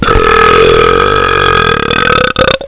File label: burp